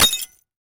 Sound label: impact glass smashing